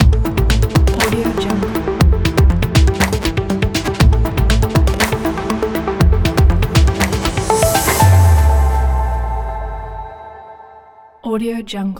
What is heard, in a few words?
futuristic opener logo